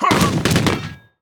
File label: enemy darksteel fist death; sfx